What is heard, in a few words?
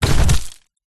impacts body fall hit ground stones dirt